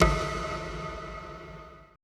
AMBI FX

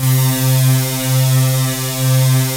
PWM PADS; Pro-Rec - Synthsonic; TR PADS

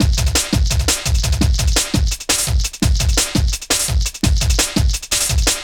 Swift Beat, Drum & Bass, Beats & Breaks

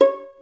Violin Pizz C#4; InVision Interactive; Lightware VOL-1; Instruments & Percussions

